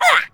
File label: male Atk; FlyForInsanity